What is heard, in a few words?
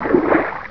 footstep slosh